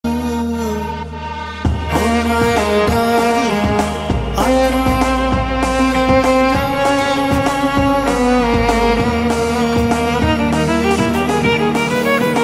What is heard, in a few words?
violinist; classical musicians